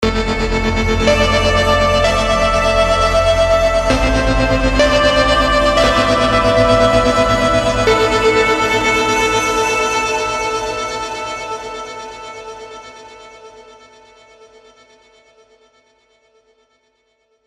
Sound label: Tape; Heavyocity Mosaic; Burning Pan Mahn